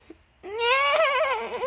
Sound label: neheheheh